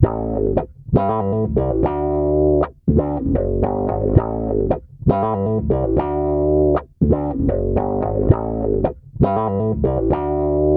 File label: Bass Guitar Loops; FM 57 - The Ultimate Sample Collection (1997)